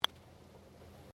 Golf Putt; Various Golf sounds; Sports

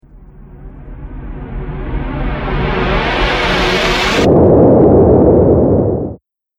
Logo Intro Sound Effect; Sonic Logo Sound Effects

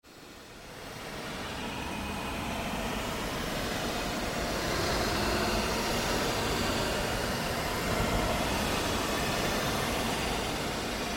Created Surge Long, Rise And Dive Sound Effects; Sound effects created by manipulating other sounds; Rises and Dives

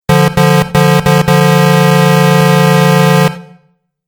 Truck Horn Angry Blasts; Truck Sound Effects

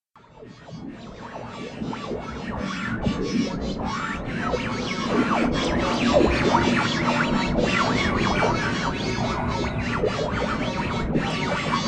Alien Radio Interference; Alien Sound Effects

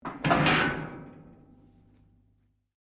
METAL RUSTY SCRAPE; Metal Sound Effects; Metal (Sound Effects:Materials)

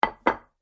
Large Dinner Plate Placed On Table; Dishware Sound Effects; Household Sound Effect; Andrew Fleming

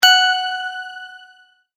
multimedia tone correct

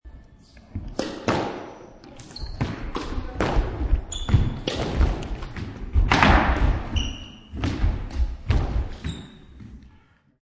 Sports Squash Rally; Lynne Music

